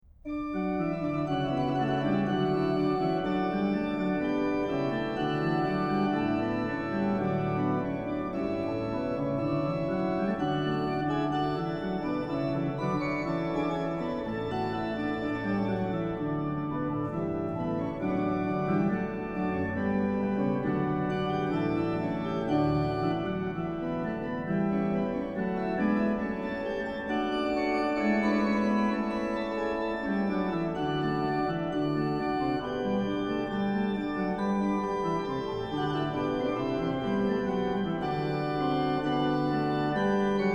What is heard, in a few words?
Jesu, meines Lebens Leben, organ; BACH; BACH ORGAN WORKS; Chorale Preludes